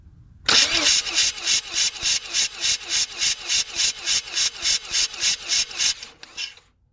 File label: car wont start rear housing; 2nd generation 1986-1992